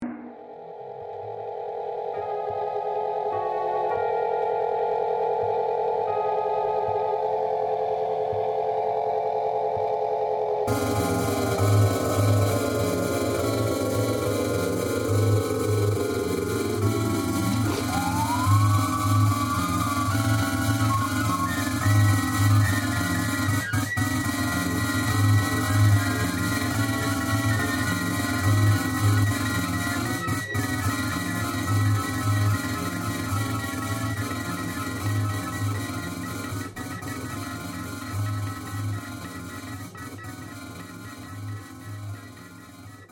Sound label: Funky Jam; Optigon